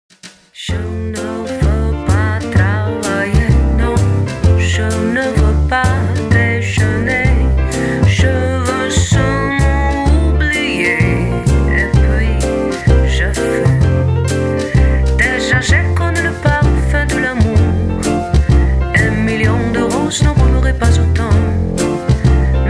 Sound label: Jazz